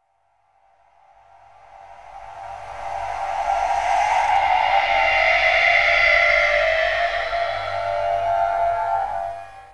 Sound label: Cat From Beyond The Grave